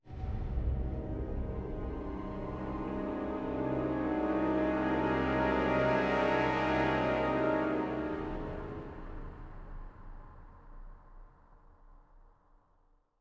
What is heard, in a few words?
Riser; N.O.V.A. 3 Near Orbit Vanguard Alliance